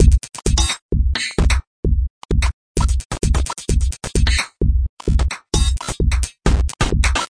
glitch techno noise burster, drum loops and breaks